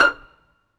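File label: STRING PIZZICATO F5, STRING SECTION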